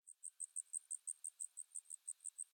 amb Crickets Oct Up; willyshotchillies

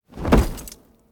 stacked Land; wild bazaar

